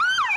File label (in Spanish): sirene policia